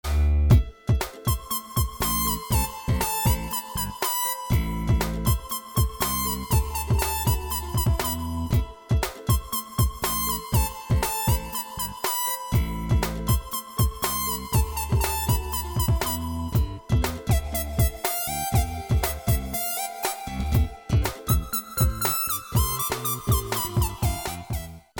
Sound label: ringtone; challengers